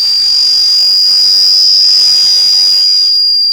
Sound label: osc scale